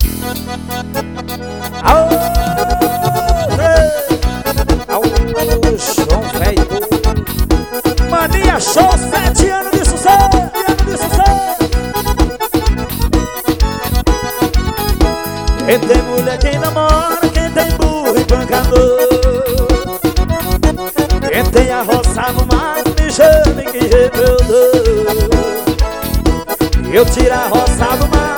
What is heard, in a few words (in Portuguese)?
forro